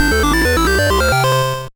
Jingle, gba; Pinobee: Wings of Adventure

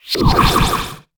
Archer Confusion; Inotia 3

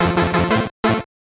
Item Pickup; Wild Thornberrys The Rambler; GBC

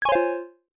Coin; line of fire arcade

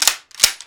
Reload; This Is the Police

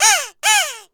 Hit Crow

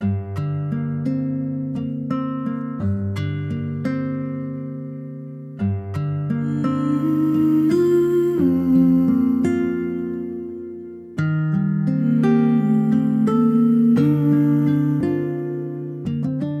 Guitar Song

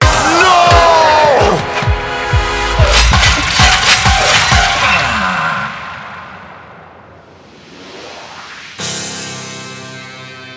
Grunt Lose; Funk of Titans; Wii U

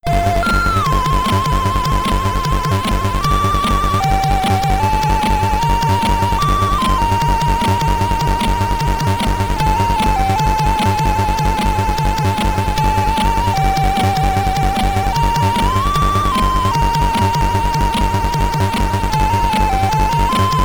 Stage BGM, SID Stereo, commodore 64; Hammerfist

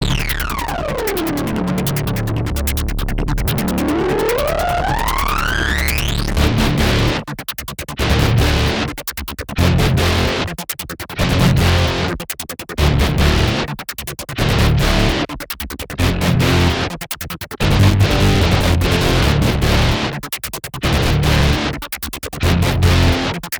Ambient Loop; Transformers: Revenge of the Fallen; PS3; Windows; Xbox 360

